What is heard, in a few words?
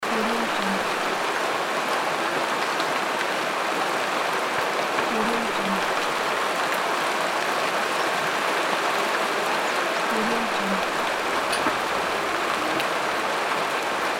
rain